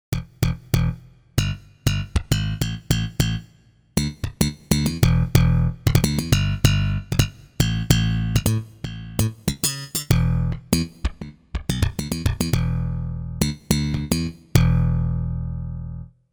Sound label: Slap, Roland JV-880; Factory Patches